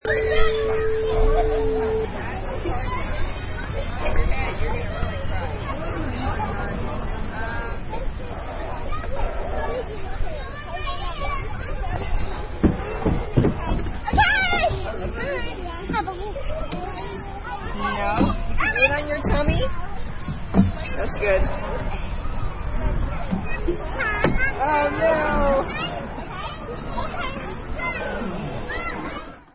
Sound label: Children Playing in Park